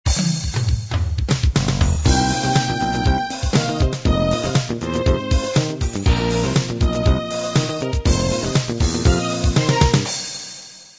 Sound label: disco miami intro